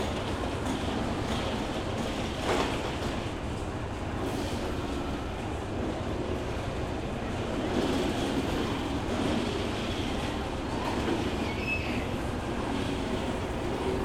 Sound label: Ambience Fun Fair Day People Screaming On Fun Ride; SoundBits; Collected Ambiences